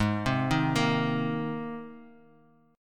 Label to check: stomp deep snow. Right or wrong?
Wrong